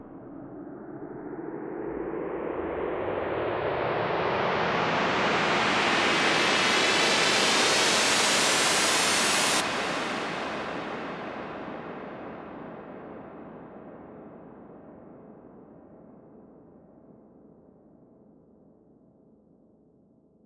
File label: Riser, fx